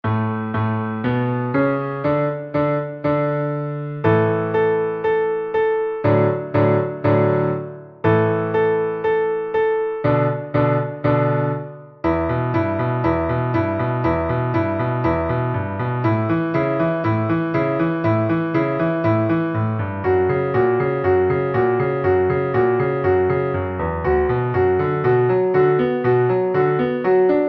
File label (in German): Klavier; Alle Spieler aufgepasst